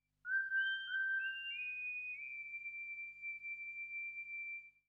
SYNTH 96 G; old school

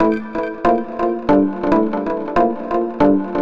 TECHNO EXTRA 140

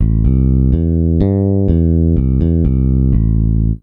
DEEPHOUSE BASS 125 A

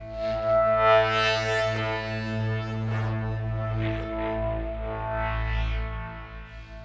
SPACE PAD 140 A; SpaceSounds

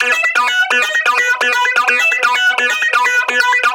synth 128 B; Electro; Anthemic; synth loops

